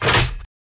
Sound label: open grate